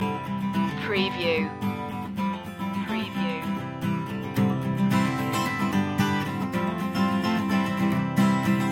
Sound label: simple happiness loop